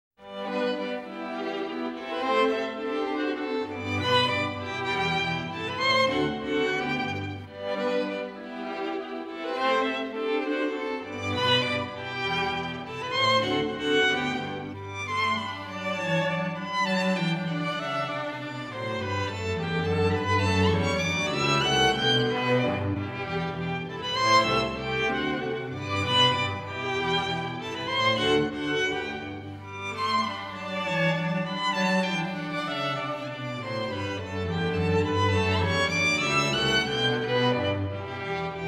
String Quartet in B-Flat Major, Alla danza tedesca; String Quartet No. 13; Op. 130; Ludwig van Beethoven; Beethoven - String Quartets Nos. 1-16